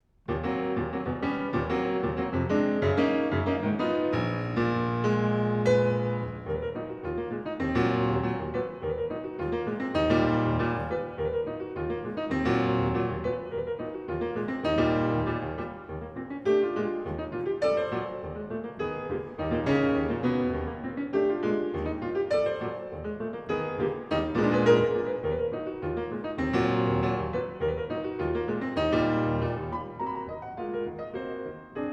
Preludes for Piano – 3. Allegro ben ritmato e deciso; GERSHWIN